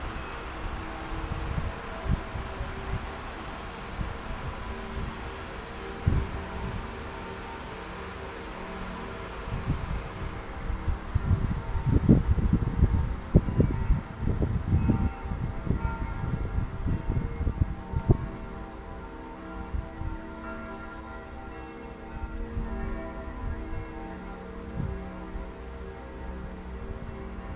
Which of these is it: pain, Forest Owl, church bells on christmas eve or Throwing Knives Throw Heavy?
church bells on christmas eve